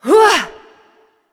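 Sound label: grunt attack, female; smite; voicepack; hera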